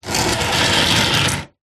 household wooden furniture cabinet small slide tile floor